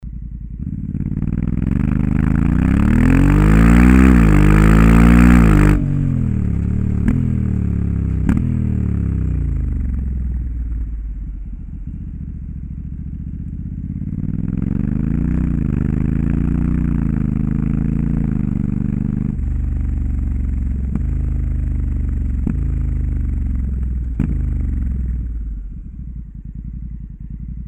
Honda Africa Twin Adventure Sport NXT ohne DBK; REMUS

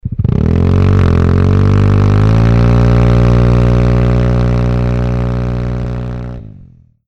Vespa GTS 300 Scooter ohne Soundeinsatz; REMUS

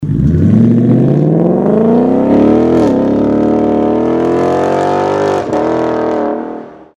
Ford Mustang VI Facelift 5.0l V8 Klappe geöffnet; REMUS

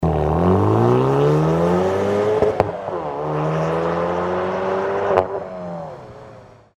Hyundai i30N OPF Back Klappe geöffnet; REMUS

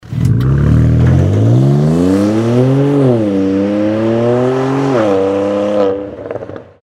BMW M4 Competition OPF Back Klappen geöffnet; REMUS

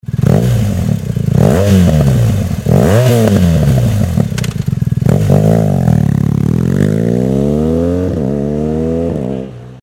BMW R1250GS ohne DBK; REMUS

